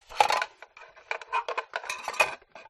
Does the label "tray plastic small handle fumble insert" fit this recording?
Yes